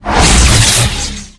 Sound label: phase in; Machines